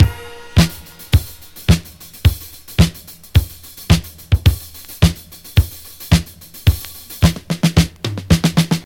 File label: 109 bpm drum loop sample a sharp key, Free drum groove - kick tuned to the A# note